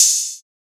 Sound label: hihat open trap; Open Hats